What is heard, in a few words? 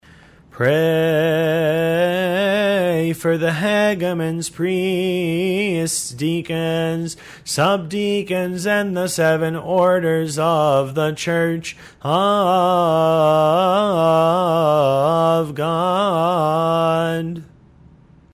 litany of the clergy, hymns